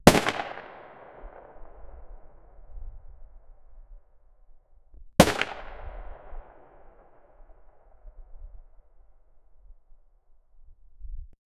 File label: 120 mm cannon shot